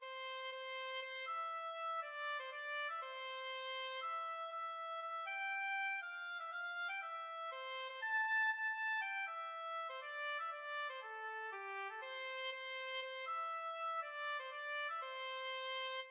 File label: ten green bottles, oboe